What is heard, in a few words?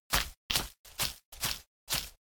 Walk; Blockade Classic